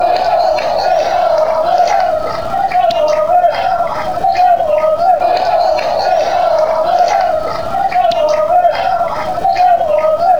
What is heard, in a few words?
yellow amy, fan chants